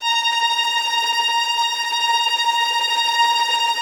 VI TREM F

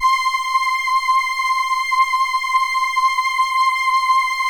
LAMBDA, String Machines

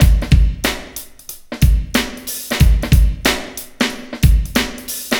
BOOM BOOM 92

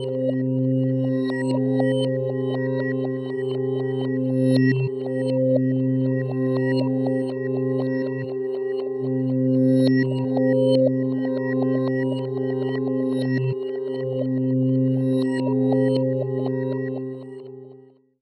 softly slipping C G Ab; Zero-G Ambiosis; Light

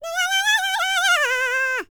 BLACK SEA, World Voices; ILIO; Vocal Planet VOL-5

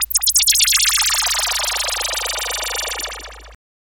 FX SWEEP

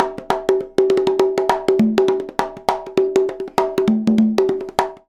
CONGA, Modern Percussion Loops

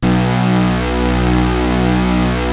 Prophet 5 B2, Synthesizer; Hollywood Edge - Giorgio Moroder Rare Synthesizer Collection

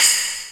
TICTALK DRUM

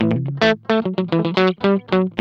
GUI, LICKS; 110 LICKS

